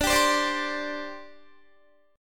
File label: D 7th Suspended 4th D Guitar; strummed; 22,22,22,19,19,19; 4E,4A,5D,5G,5C,6F; chord